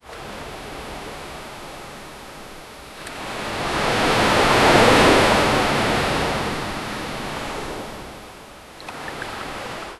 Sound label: ocean waves distant seagu; seagulls